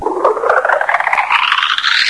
toad spin up; sven

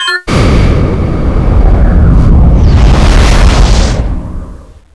reload; weapons; chronosceptor

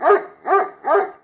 pseudodog idle

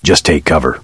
run; jc denton

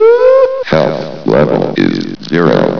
die

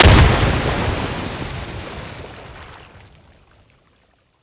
det, wpn gren

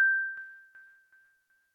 tone 8192 once; w00tguy

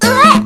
uweh

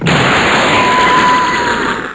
fire, hornet

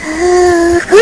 uguu

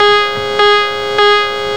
sub general alarm

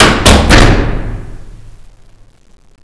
door stop

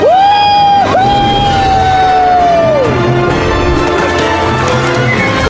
woohoo

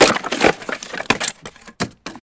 wood

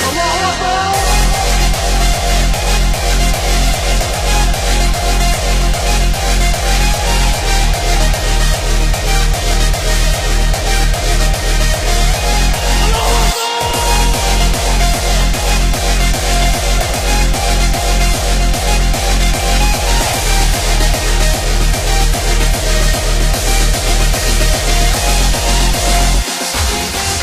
theme loop; sven; garompa theme

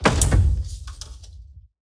train stop close

